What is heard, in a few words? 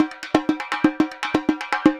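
repinique, DRUMS SAMBA; Best Service ProSamples vol.14; AKAI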